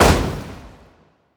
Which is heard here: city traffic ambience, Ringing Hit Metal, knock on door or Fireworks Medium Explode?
Fireworks Medium Explode